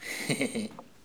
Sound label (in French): rire malsain